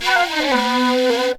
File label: FLU + VOC, FLUTE + VOCAL; Best Service; World Colours